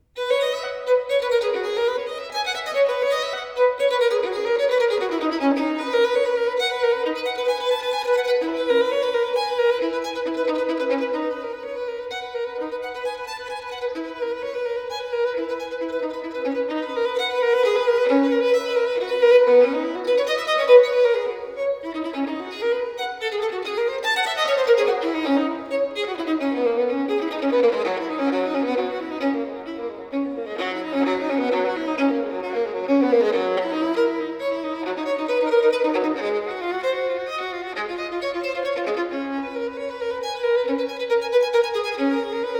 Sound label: Violin Sonata in C Major, Allegro Assai; Violin Sonata No. 3; BWV 1005; Bach